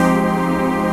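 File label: trance pad; ATMO PADS; Best Service; XX Large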